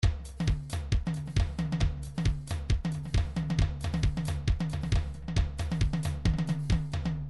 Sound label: trance midi loops beats